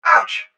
droid target droid

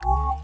button arrow forward

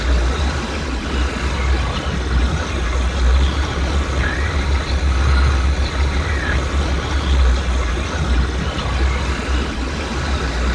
attack acid field medium

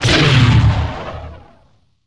ui cybernetics cannot use

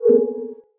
ui rollover

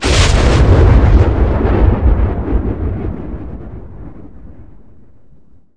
explode; yt2400

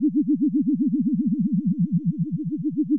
senatepod engine

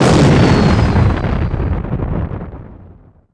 explode ywing

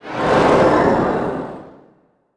force absorb

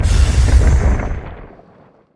item mineral tool sample

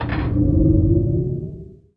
sys engine power increase

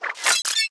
ui tech use

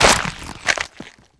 egg hatch

